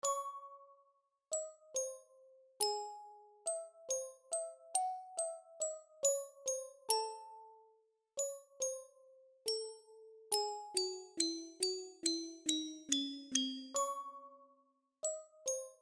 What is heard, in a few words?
horror